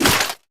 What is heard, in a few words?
damage; barnham